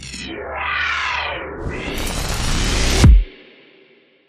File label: One Shot Riser